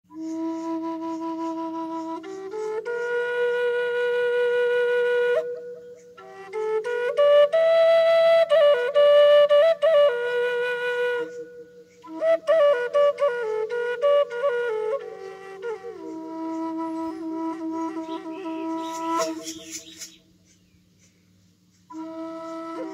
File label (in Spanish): flauta Ancestral triple en Mi E; con totem de colibri